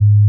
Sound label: A flat 2, pure tones